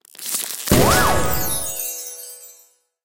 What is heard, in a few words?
Login Gifts Explosion Special; Candy Crush Soda